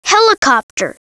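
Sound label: helicopter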